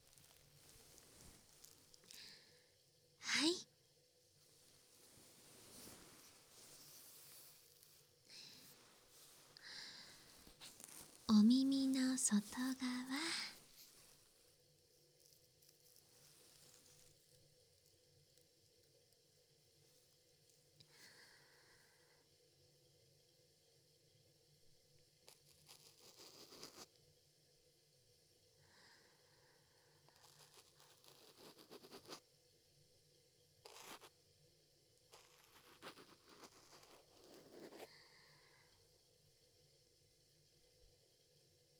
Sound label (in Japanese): お耳掃除（右）; おまかせコース; おやすみ口内セラピー